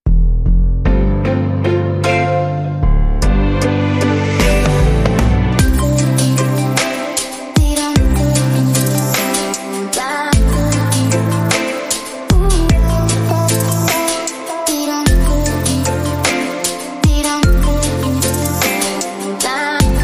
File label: Aegyo Ballad, Rhythm